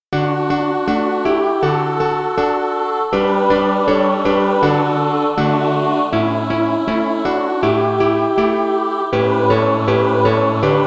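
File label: mixed, SATB Practice Music; Freely Freely Freely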